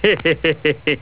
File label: laugh; homer